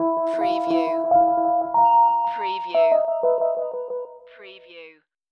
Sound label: Hip Hop Dark Rhodes Licks 90 BPM Straight - E